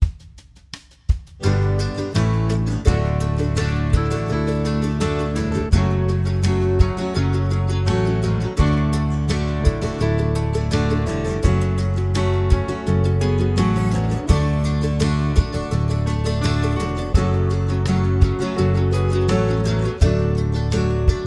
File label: major key chord singles